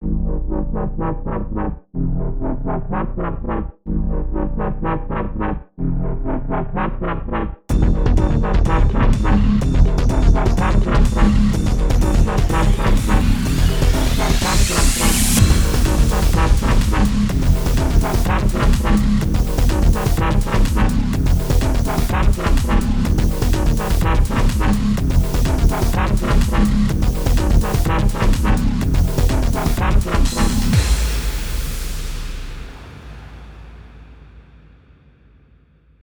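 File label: bass shaking fast hand tremor short soundtrack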